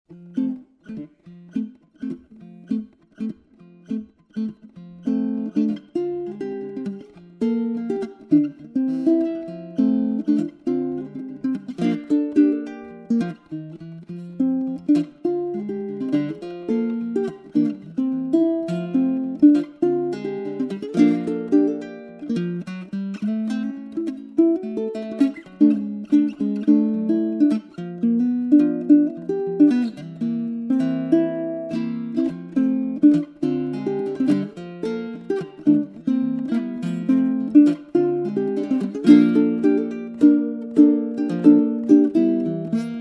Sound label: uke